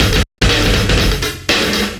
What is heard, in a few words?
live beat; mangler; Sonic Foundry (Sony Creative Software); Ugly Remnants VOL-2; beats